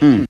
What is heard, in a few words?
mmm; ooh ahh